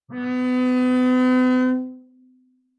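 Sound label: Bass arco fortissimo sul G C4, Double Bass; MIS Pitches - 2014; Strings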